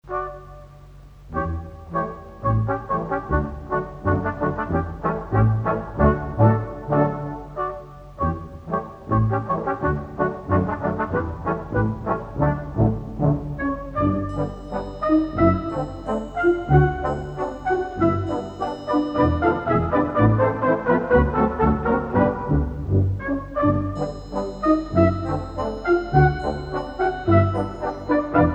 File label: pizz